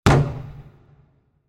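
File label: basketball backboard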